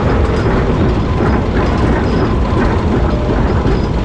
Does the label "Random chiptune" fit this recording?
No